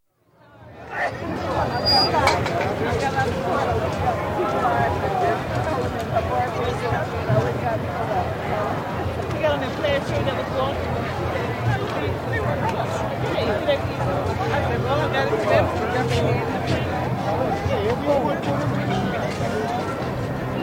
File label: New Orleans: Crowd at heritage festival; Crowd Sound Effects; BBC